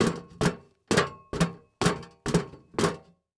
Foley Footstep Golf Cleats Jogging On Metal Platform; Foley Footsteps